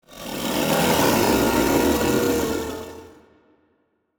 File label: kaiju hatchling rage roar; biogenesis